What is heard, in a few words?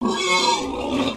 Pets Warthog Happy; creatures; Lego